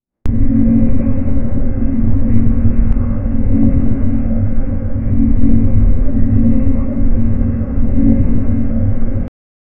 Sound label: the steampunk submarine, watercraft